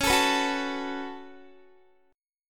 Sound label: Db 7th Suspended 4th Sharp 5th Db down Guitar; strummed